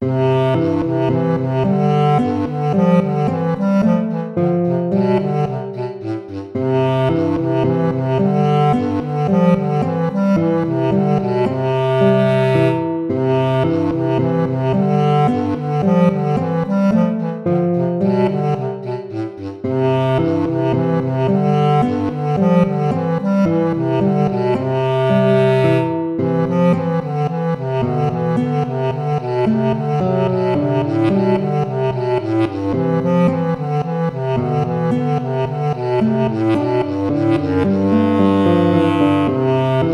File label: tempo minuetto; hook james